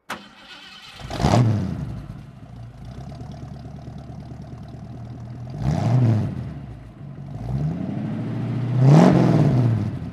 ford mustang conv throttle

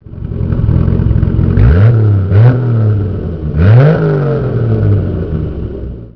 vitesse

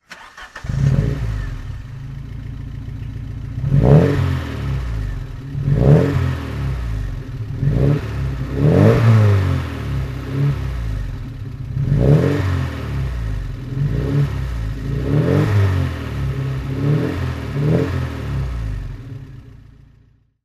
alfa romeo spider t4 20 throttle

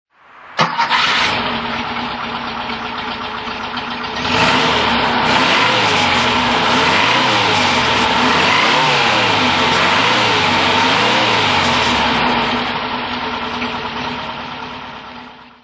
porsche 912 throttle

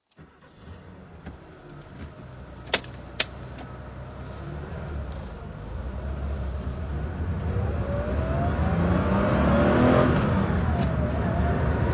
drive, Porsche 911 SC 3.0 Targa, 1979; Motorgeluid rijdend; V3D/ ClassicarImages

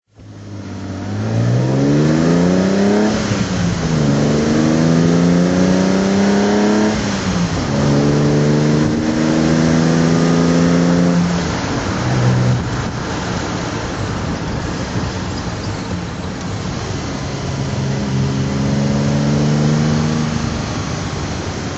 triumph tr6 pi drive

